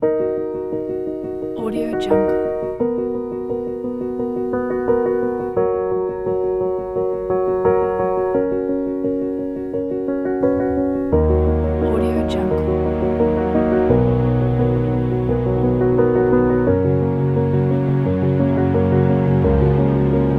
emotional piano

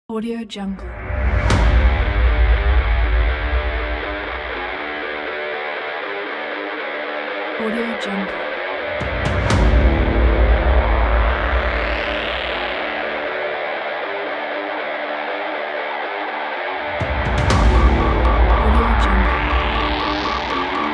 action cinematic hybrid trailer

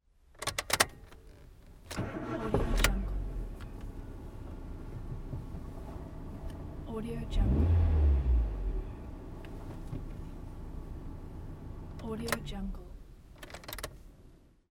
car engine start

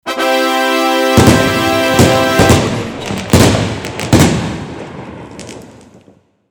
jubilant fanfare and fireworks

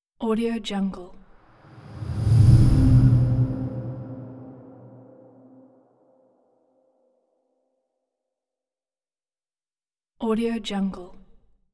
scary eep air pass by; hollywoodedge

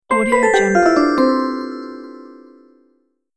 cartoon magic walk down

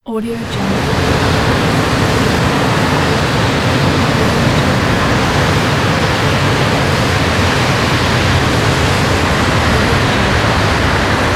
blizzard

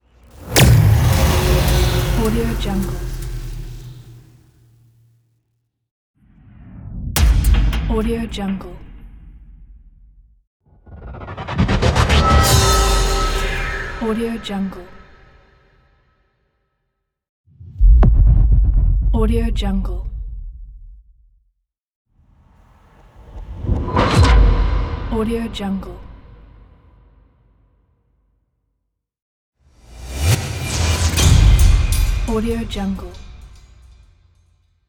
power trailer impacts

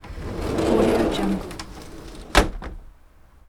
sliding closet door

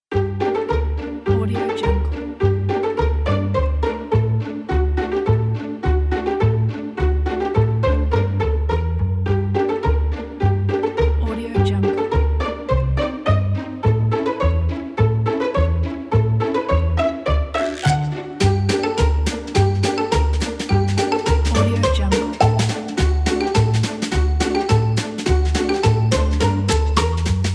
funny

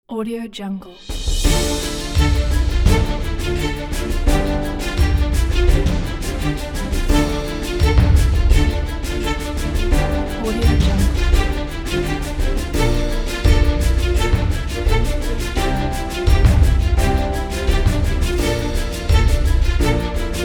news today; studioetude

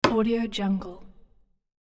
chisel and hammer single hit

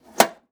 toolbox latch close; prosoundfx

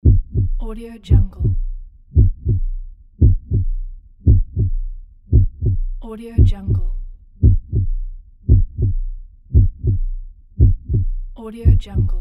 heartbeat

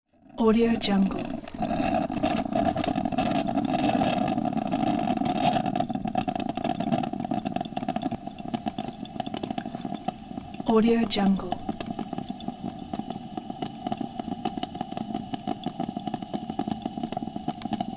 coffee brewing

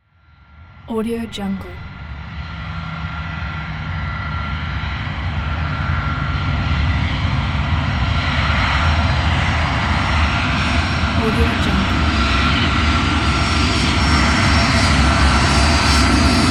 jet parking

